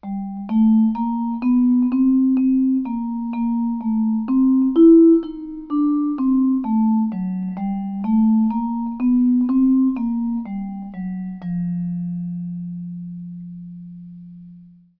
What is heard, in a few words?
bassline